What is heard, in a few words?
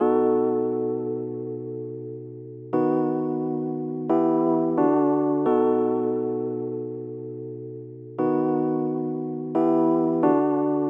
G 176bpm keys; Bleu Moon